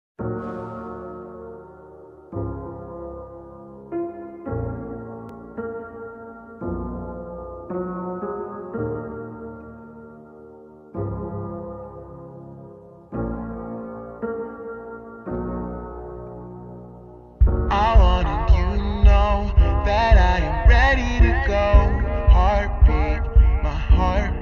slowed daycore

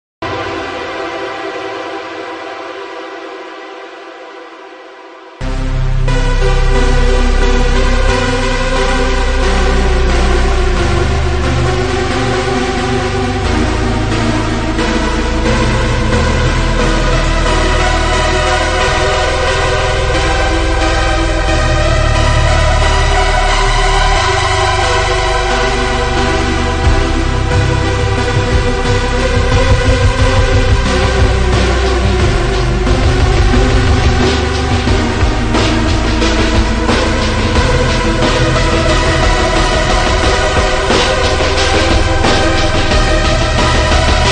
loop slowed

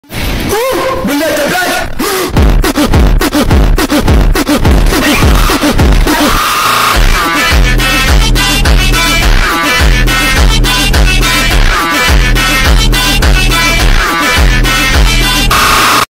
phonk; Suka blyat

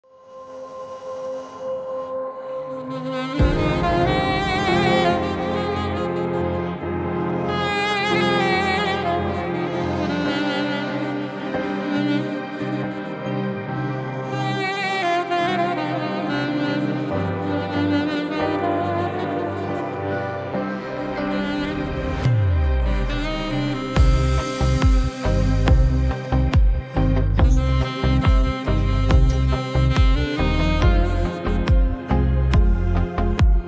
saxophone cover

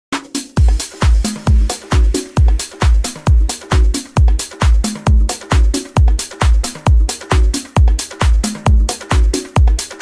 tech house club mix

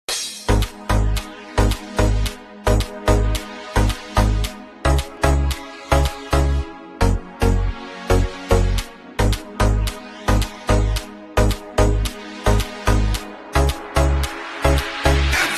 remix bass

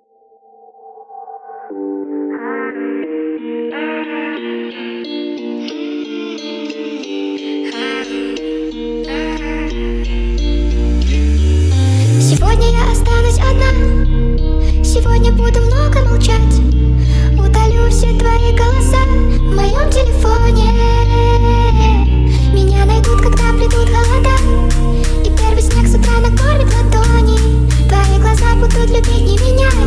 hardstyle remix

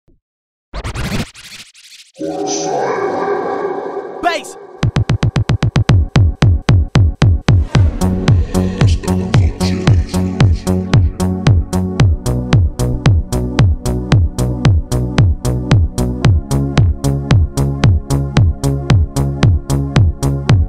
korg pa 900 eurodisco80

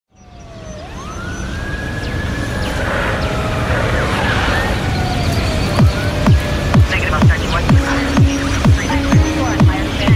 instrumental

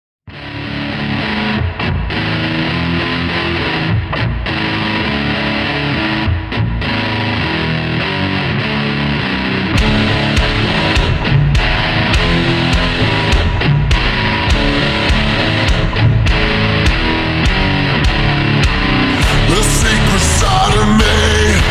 slowed reverb

